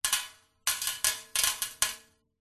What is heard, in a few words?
stones gravel small sprinkle handful into metal container; foley; zapsplat